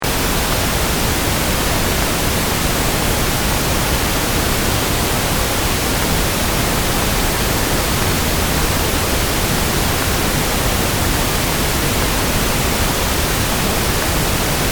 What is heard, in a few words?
Pink Noise